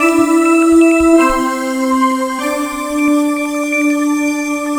Synth, Trip-Hop Loops; Sonic Foundry (Sony Creative Software) - Rondo Brothers Trip-Hop Loops & Samples; El Corazon

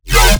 BASS SHOT

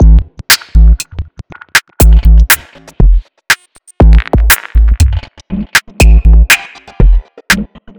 Dub Beat, Drum Loop; Laundry Dub Beat; Sonic Foundry (Sony Creative Software); The Electronic Music Manuscript Collection; Drum Loop Structures

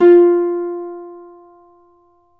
Celtic Harp, Plucked Strings

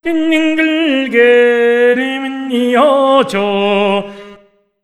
MONGOL, Asian Traditions

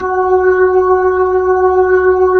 B-3, Jazz

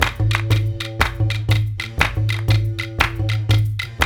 World Dance; OZ!

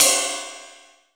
Linn 9000 ride; Akai MPC-5000

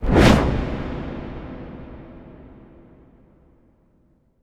Impact Wipe, Cinematic

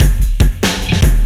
live beat punky shuffle; Sonic Foundry (Sony Creative Software); Ugly Remnants VOL-2

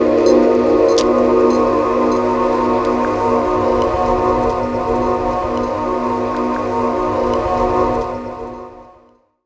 Ambi Strings; Zero-G Jungle Warfare 1, 2 & 3; Strings & Things

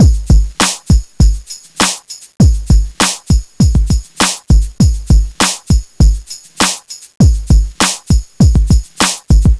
House 100; Foundry (Sony Creative Software); Hip-Hop Street Beats Construction Kit; FunkyXtreamsII

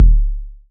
kick machine

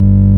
ORG VOX, Continental; Roland LCDP09 Keys of the 60s and 70s 1

